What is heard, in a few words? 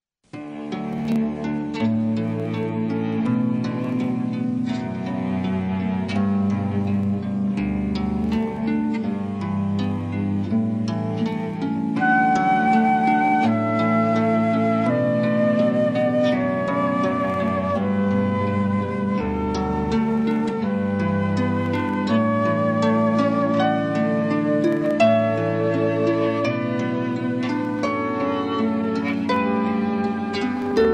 harp; pachelbels